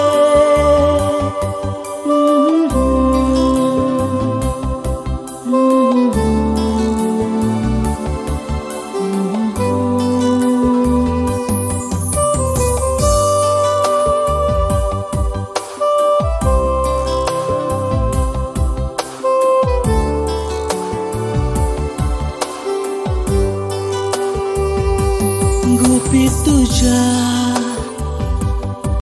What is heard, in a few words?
new konkani song ringtone